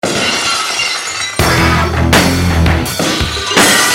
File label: sms ringtone